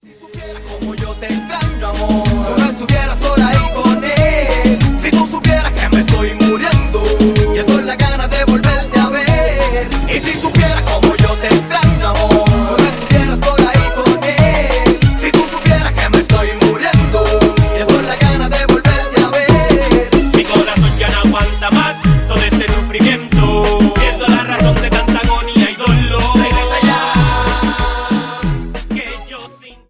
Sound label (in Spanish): raices del reggaeton